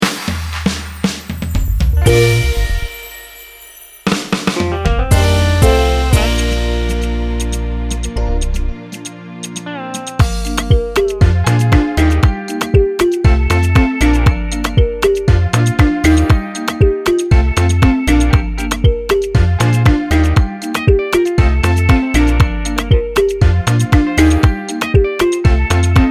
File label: Beat